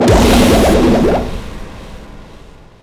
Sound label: blood laser short